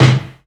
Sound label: snare deep; Hip-Hop; Drums; Drum One Shots; Slate; CAICOS Hip-Hop Sample Pack